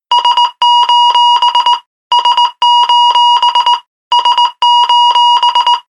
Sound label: Sos Tone